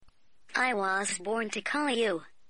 jazz music tone; nokia ringtones

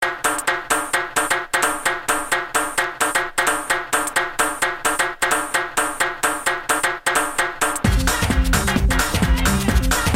club house dj remix musical tone ringtone

